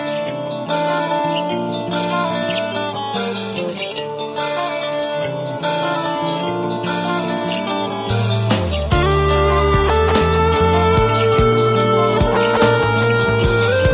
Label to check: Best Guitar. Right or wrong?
Right